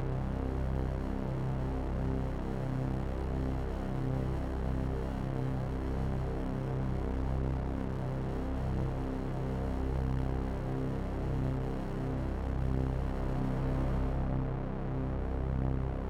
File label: Care Package Loop; Void Bastards